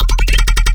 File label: Bass Sample